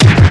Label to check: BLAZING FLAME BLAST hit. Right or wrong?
Right